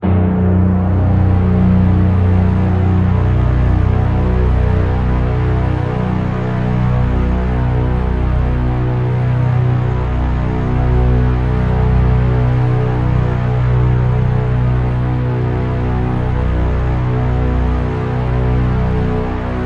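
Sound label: drone nice buzz B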